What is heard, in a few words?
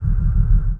Starbase Fortify Amb; Sid Meier's Civilization 4 Complete; Beyond the Sword; Final Frontier; Units